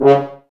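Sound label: Brass French Horns C#; Sample CDs; Miroslav Vitous; Woodwind Brass Ensemble; F.Horns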